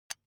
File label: ui button electric drill direction switch lock soft